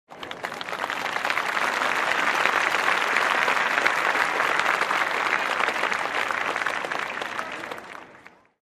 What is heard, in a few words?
Audience in a show warm applause